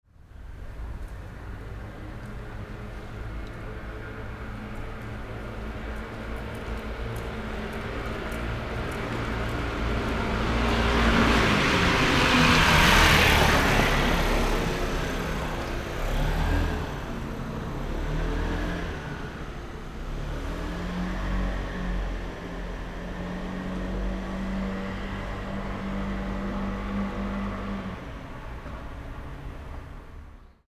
motorcycle passing by

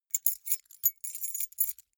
key ring small rattle hard

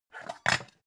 pan lid slide

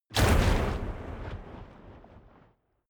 waves surge hard

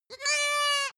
goat baa lamb stutter

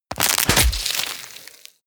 tear out bones fleshy

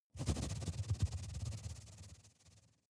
rat movement fast scrape

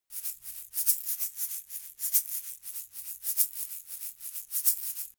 eggshaker slow short

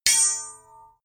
sword hit sweetener

